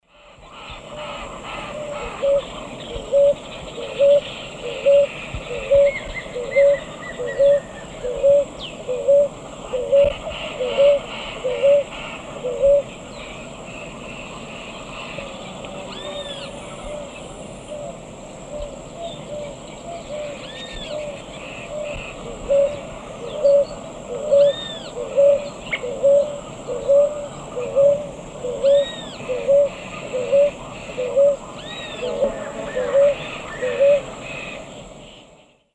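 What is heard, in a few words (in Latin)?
Columbina talpacoti, song playback; S. America; Eastern Lowlands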